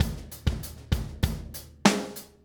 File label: 098 Steady Drums; Pop Rock; Moderate Tempo; Pro Tools Essential Loops; Digidesign